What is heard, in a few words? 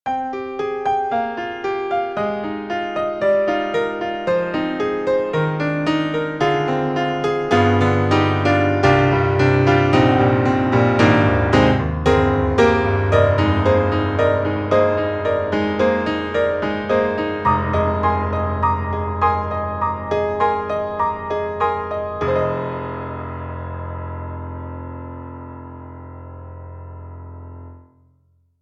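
Yamaha C7 Close, piano; Steinberg; The Grand 3; Lifetime Performance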